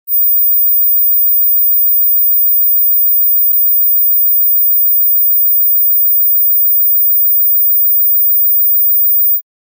17khz Frequency

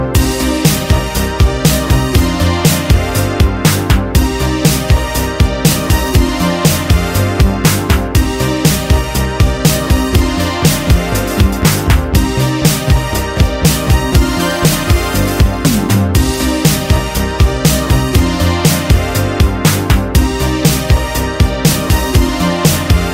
vaporwave

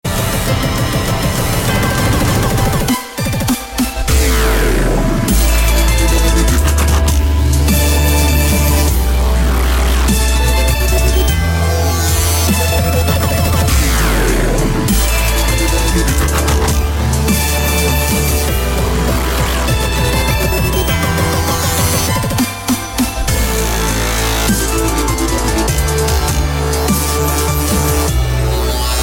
Evil Dubstep

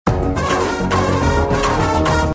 Kick Bgm Telugu

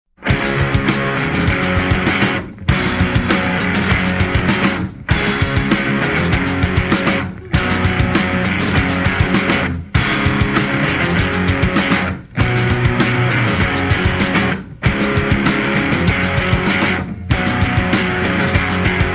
Funky Rings

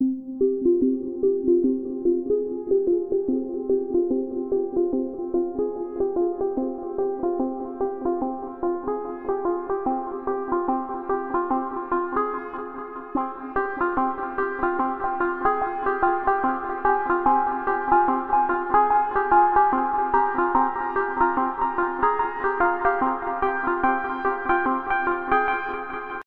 Ambiental

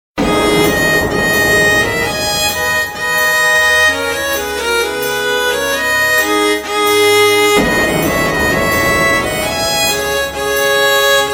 Sweet Violins

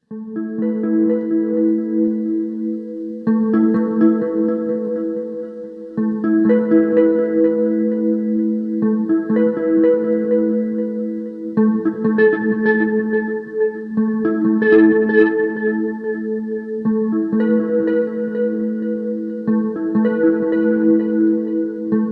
Alarm Clock Delay

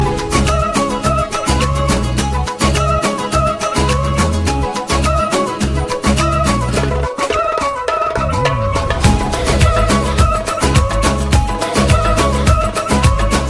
Lovely Music

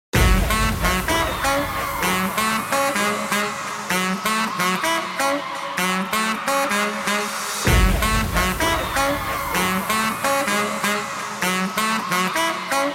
Sax Megamix

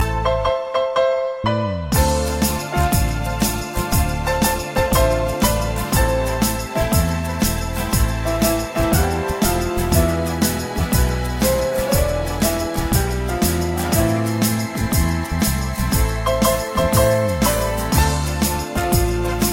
Classic Piano Song

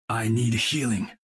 Text Tone

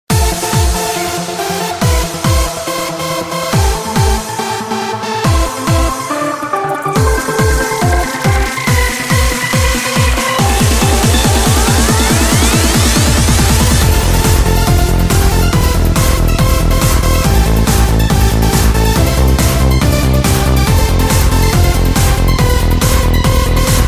Trance Mix

